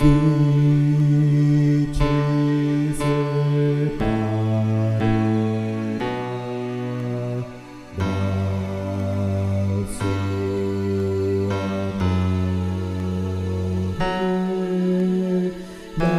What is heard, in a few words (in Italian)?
Chi ci separerà bass